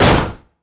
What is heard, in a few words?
HEAVY, COLLIDE; ImmersionRacing